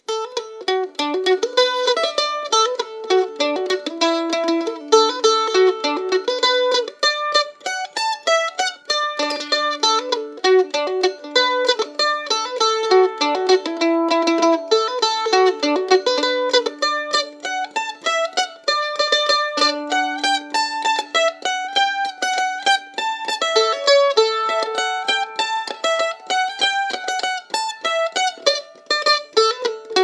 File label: soldiers joy